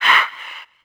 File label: grunt light hurt